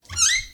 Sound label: Creak